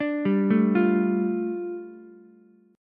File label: A 7th Suspended 4th Sharp 5th, A, down, Ukulele; A7sus4#5 arpeggiated